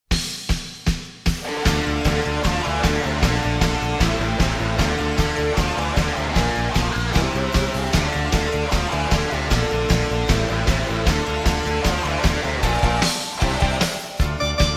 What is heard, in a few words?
instrumental; Choujuu Sentai Liveman